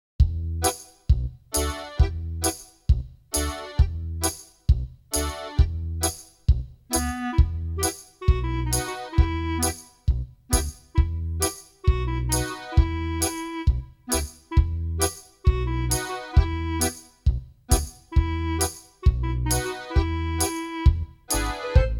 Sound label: Innkeepers Song backing; 2024 nativity